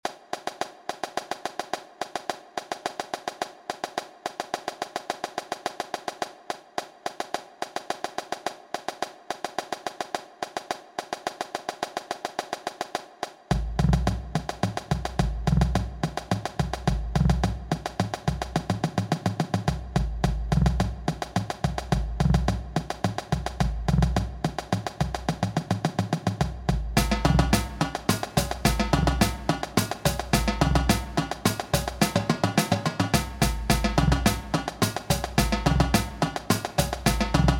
wolf cadence

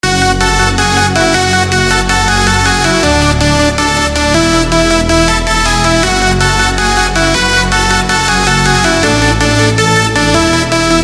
a new hope hardstyle

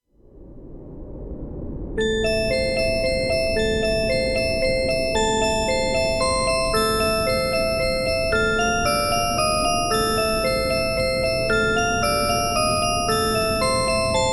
the haunted music box

